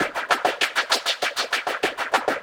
Echo Chuff Splat, Synthetic Pulses

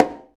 Conga Slap; Roland BK-5; Percussion

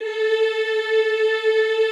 EEH, Classical Choir

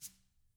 TAMA BR SW, Drums; ILIO; Double Platinum Drums 1

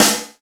SD, Yamaha RY-10, Drums

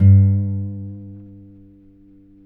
Finger G 2 R, Guitars; Spectrasonics; Hans Zimmer Guitars VOL-1

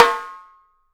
Studio Snare; E-MU Producer Series Vol. 5 – 3-D Audio Collection; 3DPercussives

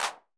clap; Roland MC-909

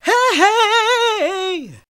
GOSP. Singin; Sound & Vision - Gigapack II CD 1 (Roland); HAL Gosp.Singin`

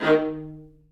Vla D#2; Digital Sound Factory Virtuoso

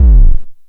BASS, Dual Percussion Synthesiser; Klone

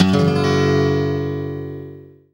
STL STRNG CRD; Best Service; World Colours

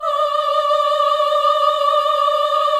VIB F D5, Symphony of Voices, VIBRATO; Spectrasonics

